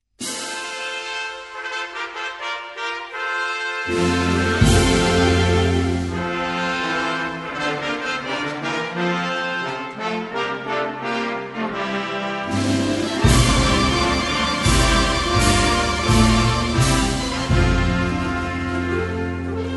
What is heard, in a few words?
Festival Fanfare; bandas